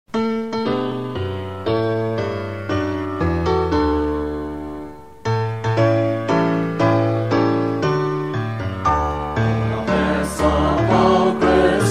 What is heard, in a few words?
School Song in English